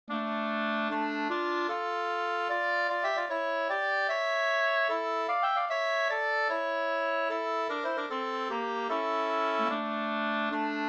vals brudvals

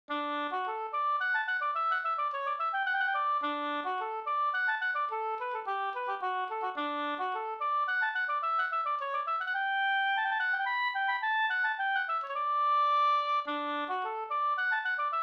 polska Bingsjopolska polska; Norrbommens polska e Pekkos Helmer

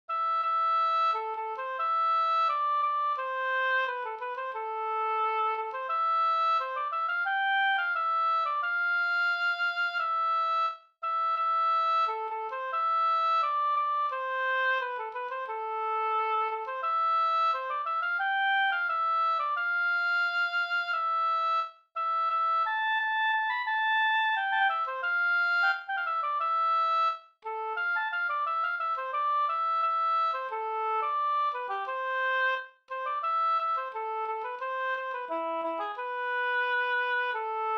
skanklat

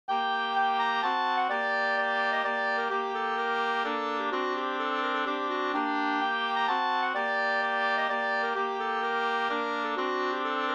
folkdans; Daldansen sfvod25 2st

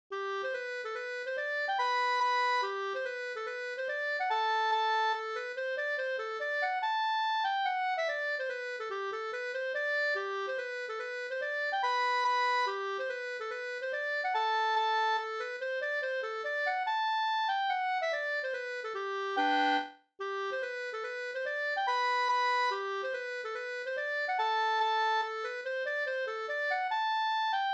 langdans; fr Nk Grona boken

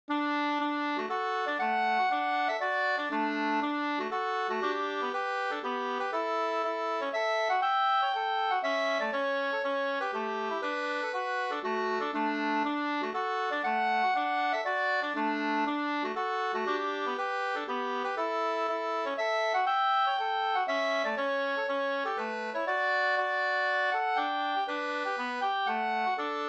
polska Bodapolska D dur polskan

